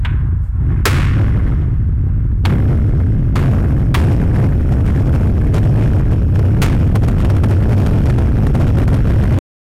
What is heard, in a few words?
raging fire